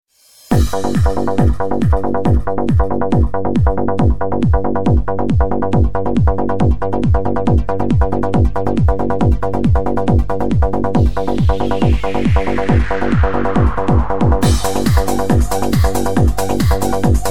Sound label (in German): brummen trance